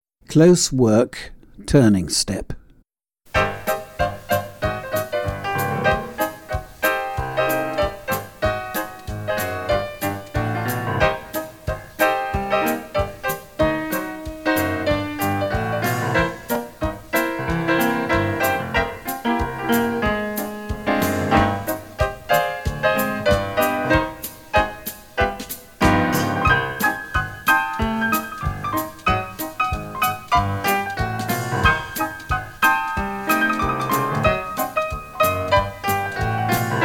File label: Closework turning step, tap